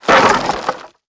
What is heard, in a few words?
objects, World of Tanks